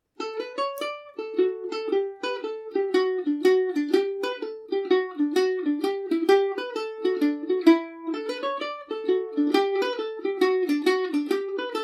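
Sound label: fishers hornpipe D major, irish mandolin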